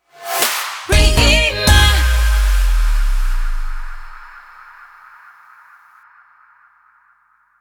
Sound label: Jingle Sting; Rhema